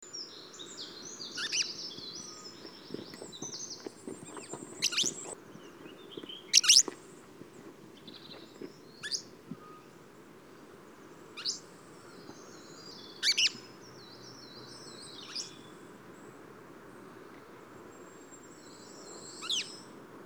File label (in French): hirondelle de rochers